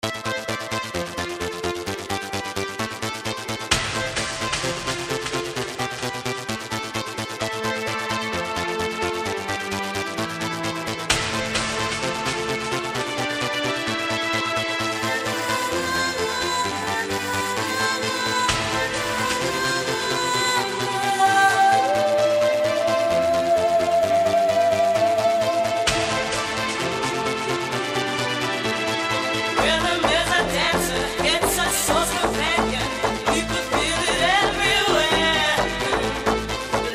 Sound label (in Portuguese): especial retro; gol g2 do gordo